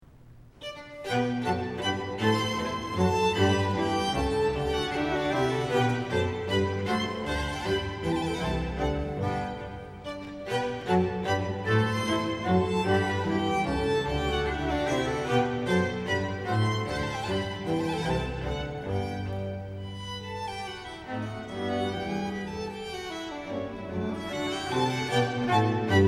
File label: Menuetto (Allegretto And Trio)